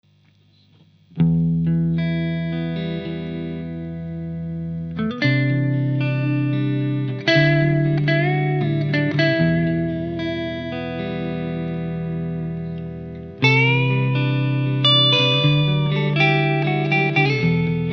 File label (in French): Custom3 Canal Clean; Prise Custom3